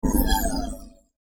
Science Fiction Spaceship Pass By Left To Right Fast Whoosh Flange; Spacecraft